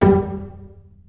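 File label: solo pizzicato, Strings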